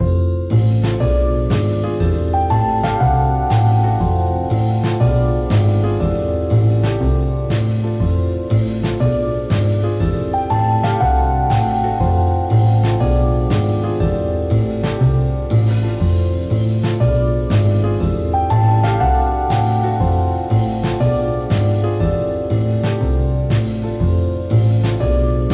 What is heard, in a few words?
Jazz